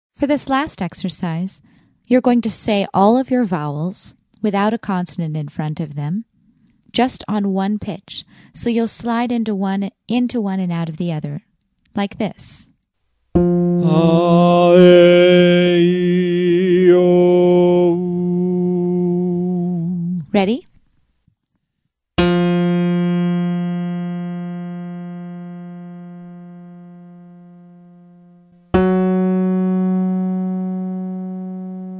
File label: Vowel Slurs male